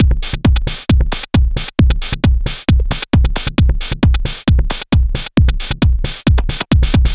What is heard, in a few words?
Dance Drumloop; Drums; Dance Beats